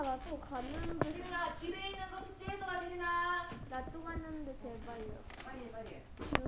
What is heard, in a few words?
laugh